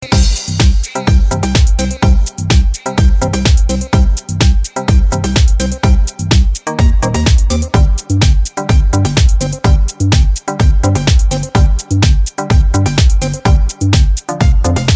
Club Mix

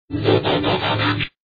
Alert; Transformers 3

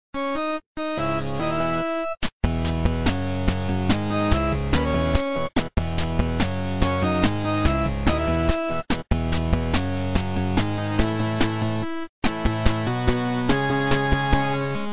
8bit

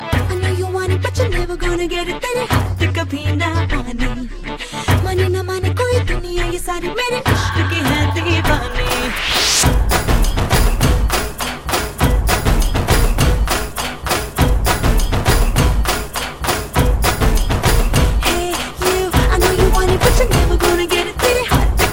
Super Beats

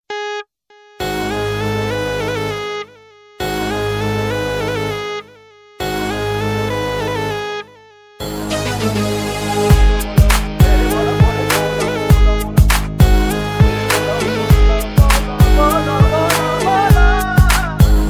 Cool Tone

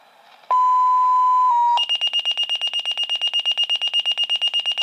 Fire Pager

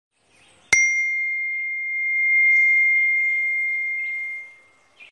Tin iPhone

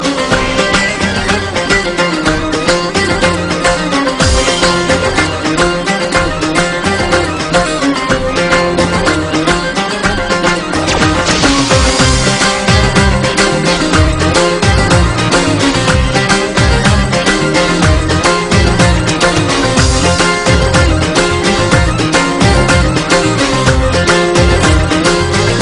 Pashto

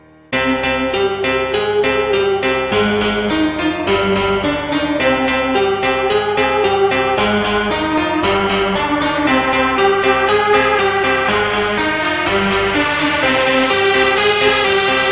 Cool Beat

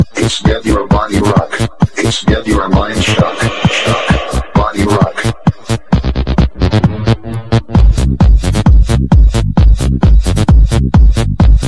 Nasty Bass

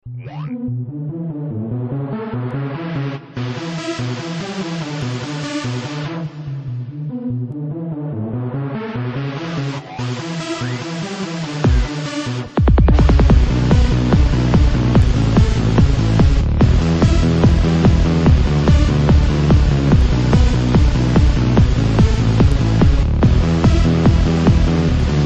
Trance Techno Mix

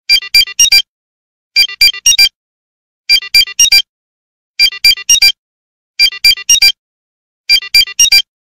Beep; Kimmunicator

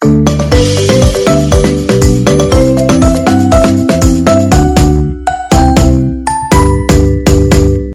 Jingle Holiday

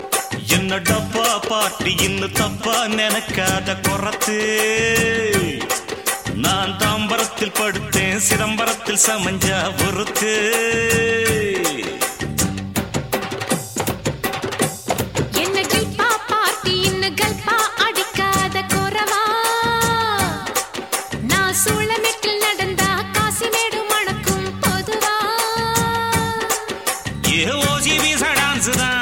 Tamil Gana

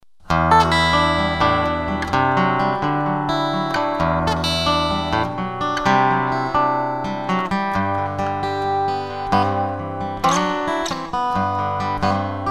Awesome guitar

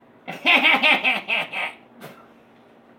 Obnoxious laugh

